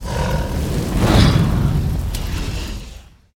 dragon boss; monster

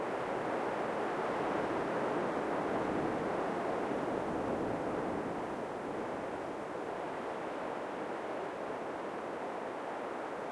village, desert

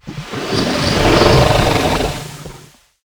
seed frog

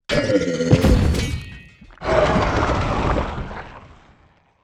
frog death, monster; frogmk2

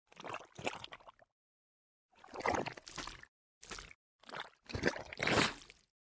mutant fish idle, monster

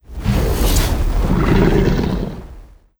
boss, monster; sinhead; lava

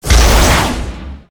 skill explo

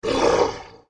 vampires damage

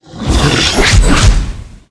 stone attack

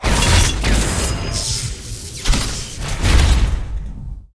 mship boss death, monster

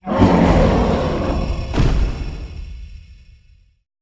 dark general death, monster

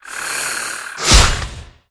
naga attack, monster

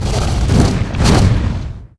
queen attack, monster; lug

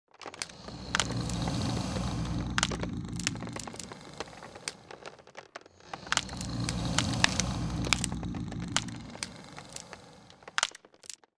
lancer idle, monster

